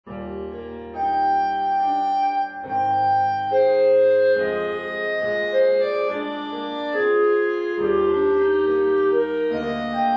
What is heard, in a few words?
Canon Clarinet Piano; Dubois